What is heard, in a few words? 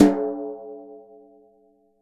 Kit Brush, Drums Acoustic; USB Soundscan vol.10; AKAI; 90s sample CD; ALL BRUSH